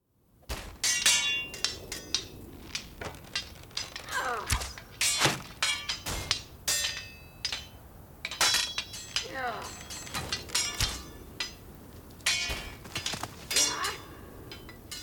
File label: Battle of the Amazons Training Ground; AMB Only